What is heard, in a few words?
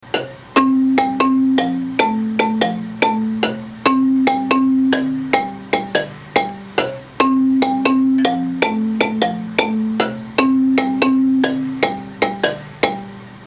bari first line, marimba; manhanga